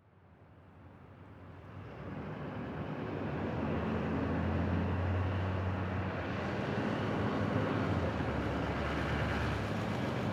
Diesel Truck Up Shut Off; transportation

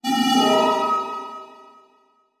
Transition Musical Tone Medium; Production Element

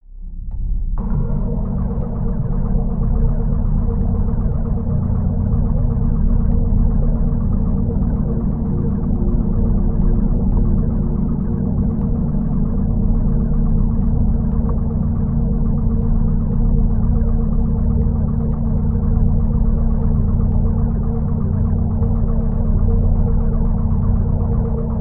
Drone Bed Pulsing Underwater Chatter; Production Element Imaging; AdobeCC

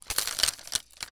Foley Rattle Spin

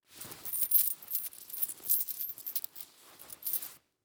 Coat Reach In Pocket Jingle Change; Foley

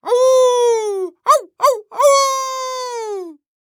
Horror Scary Human Male Howl

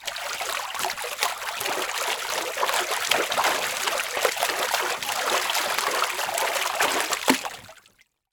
Liquid Water Water Splash Movements

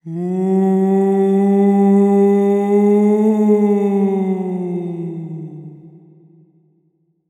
Horror Scary Ghost Moaning

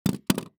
Weapon Pistol Fall On Wood Floor; AdobeCC

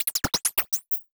Multimedia Internet CD-Rom Flash Click Multiple

